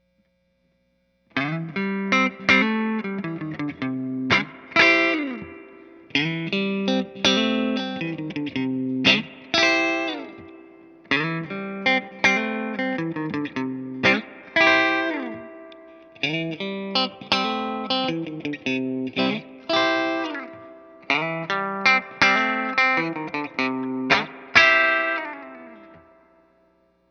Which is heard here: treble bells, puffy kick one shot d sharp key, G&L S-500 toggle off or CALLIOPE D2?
G&L S-500 toggle off